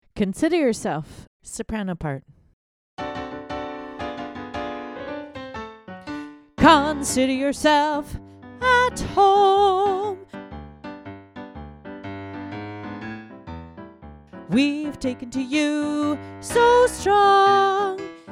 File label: sop